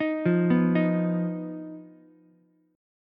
D sharp Suspended 2nd Sharp 5th D sharp Ukulele; arpeggiated; JGuitar

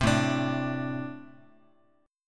A-Minor Double Flat 5th A Guitar

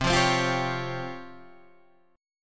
C sharp Major Sharp 11th C

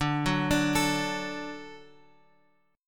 D Major D down Guitar Open G

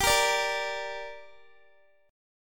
G Minor G down Guitar, chord; Gm strummed

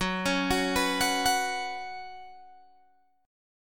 Gb Suspended 4th Gb, down, Guitar, Open G

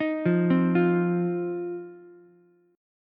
C Minor Double Flat 5th C down Ukulele; Cmbb5 arpeggiated; JGuitar

